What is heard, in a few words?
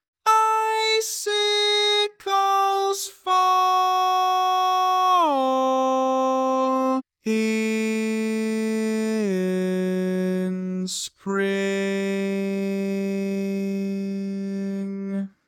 Lead, barbershop tags